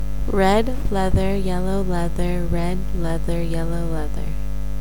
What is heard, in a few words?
leather